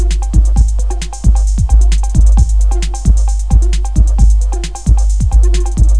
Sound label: electro; Guitar&Electro